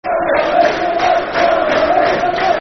Green And White Barmy Army, Football Chant; Yeovil Town Ytfc; fanchants

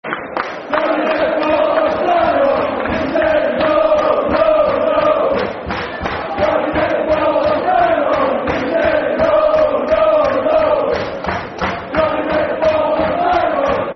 No, No, No Football Chant (Soccer Song); Sunderland; Sunderland Safc

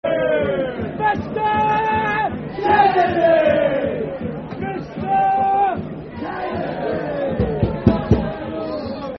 Mr Kennedy, Football Chant (Soccer Songs); Darlington Dfc

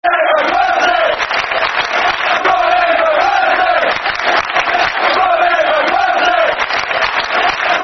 Football Chant (Soccer Song); Robin Van Persie

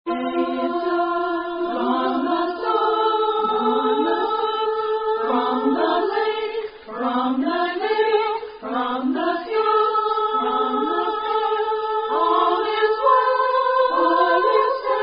Taps; girl scout councils; mid atlantic

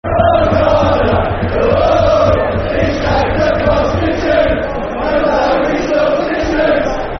Football Chants (Soccer Song); O'connor; Birmingham City Bcfc

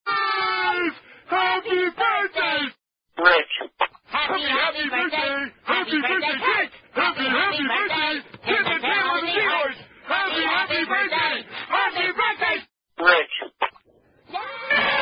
Happy Birthday, Happy Happy Birthday (Variant)

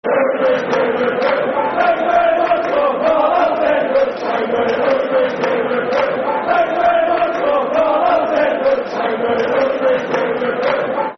Thank You Very Much, Football Chant (Soccer Song); Sunderland; Thank You Very Much For Carlos Edwards; Sunderland Safc

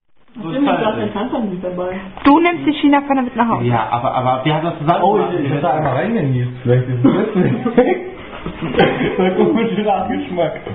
German Drinking Game; Unknown German Drinking Game

